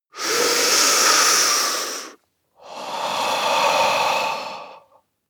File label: breath male deep